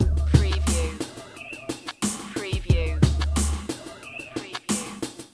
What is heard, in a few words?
Hip Hop Drum Loop 90 BPM - Straight Without Screech